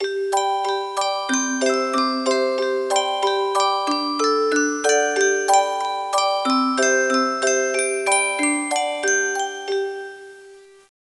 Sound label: Country; Petz 5